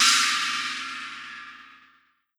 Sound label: china, virtual drum sounds